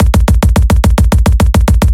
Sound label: loop dshk bass, guns tanks